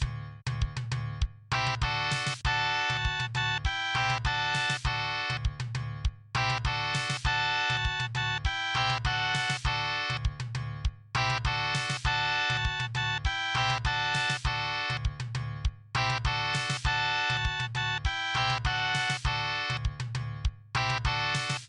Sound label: ringtone